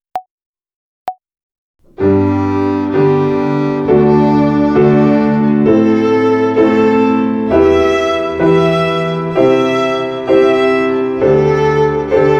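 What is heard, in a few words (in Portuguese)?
Ginástica das cordas soltas com Detaché, Violino; Binбria; Violino para os Pequeninos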